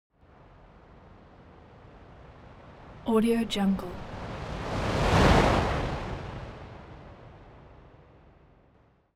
elements fire torch bright slow long flutter whoosh; smartsoundfx